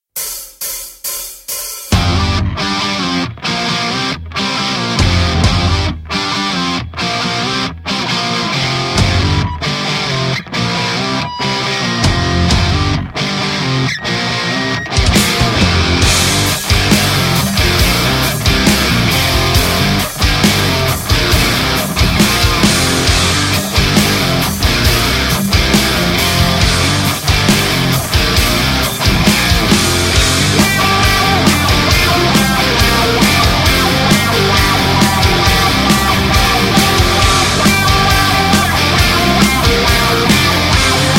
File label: Metal; the muscle hustle; android ios